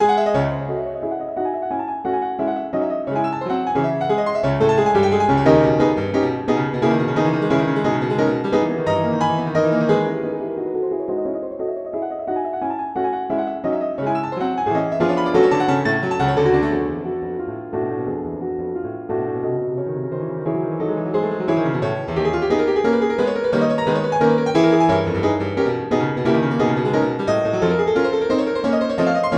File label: Mic Pair; Chopin Etude